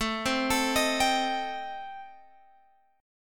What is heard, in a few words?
A Minor 7th Flat 5th A, Guitar, Open G